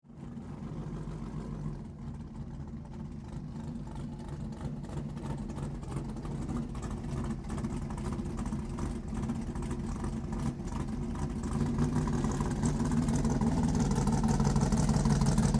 Dragster Idle, Sports, Dragster, Idle, Warm, Up, Drag, Away; Race Cars; Blast Wave